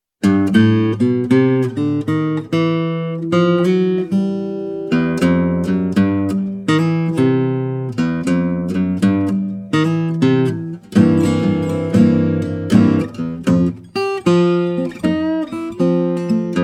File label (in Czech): Snajper Blues A mol